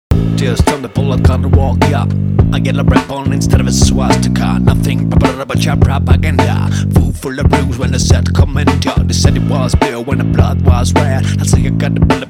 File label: rap